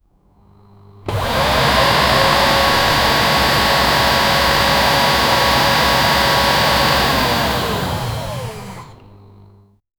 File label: air movement; for a short film